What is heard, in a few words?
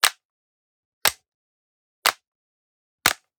Generic Button Plastic Medium; boom library; The Interface